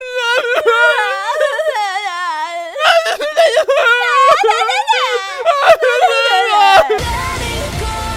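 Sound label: piano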